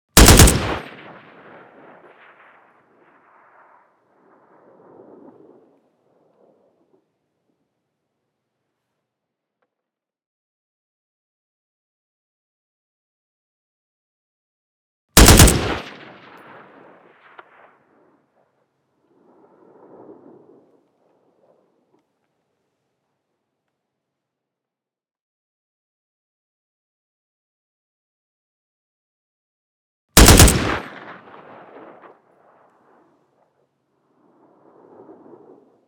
AK47 Burst With Tail; Watson Wu

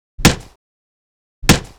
Hit; NoizBoy - Hong Kong Action Kit - Hit Kit 2

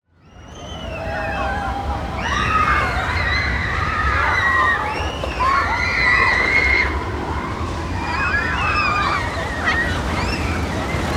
Pass-By Fun Ride; SoundBits; Pass-By Fun Rides

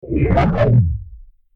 time start or stop; FXHome

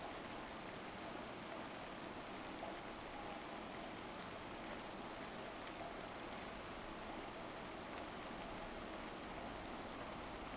rain street; 99Sounds; Rain And Thunder

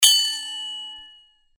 Sword Sound; Fighting Sounds; FXHome

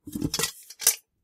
pickup, Helmet; Kenney's Sound Pack; Foley Sounds

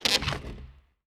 Wooden Plank Scrape; 99Sounds; The Warehouse

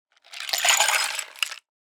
pouring, Bar and Coffee Shop; SpillAudio

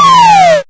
lose; Kenney's Sound Pack; Retro Sounds 1

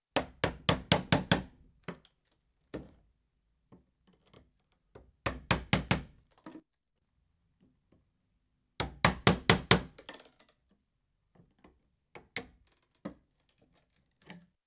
Chisel Hammered And Wood Levered; Construction; BBC Sound Effects Library

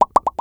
Scatter Plops; Game & UI Interface; L.A. Sounds

